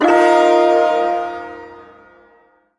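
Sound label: Shawzin Pentatonic Minor Chord; Warframe; Duviri Ersatz Shawzin; Chords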